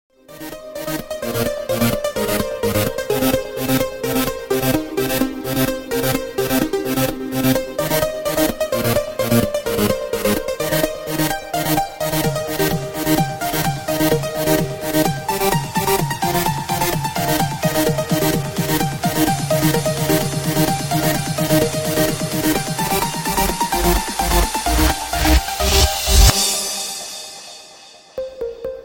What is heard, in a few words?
popcorn